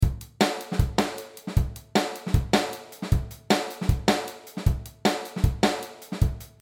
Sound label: Boogaloo 155